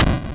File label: various, explosions